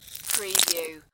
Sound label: Snow and Ice, Bits of ice falling on ice